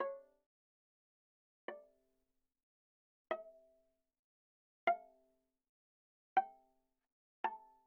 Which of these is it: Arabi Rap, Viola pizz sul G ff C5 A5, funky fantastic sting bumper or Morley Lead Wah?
Viola pizz sul G ff C5 A5